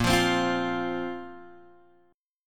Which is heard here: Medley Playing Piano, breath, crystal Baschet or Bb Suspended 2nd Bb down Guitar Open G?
Bb Suspended 2nd Bb down Guitar Open G